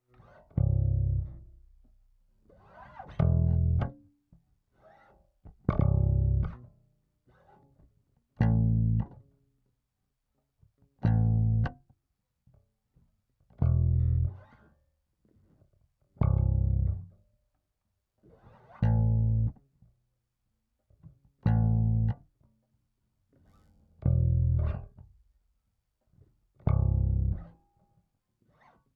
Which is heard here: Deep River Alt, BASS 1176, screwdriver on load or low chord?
BASS 1176